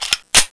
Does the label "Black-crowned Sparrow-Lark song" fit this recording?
No